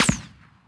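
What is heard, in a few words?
ricochet; tusken; Star Wars Obi Wan; XBOX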